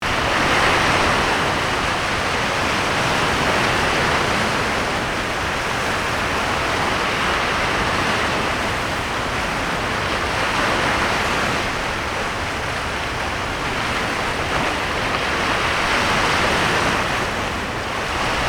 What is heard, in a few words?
Sea; ARESMII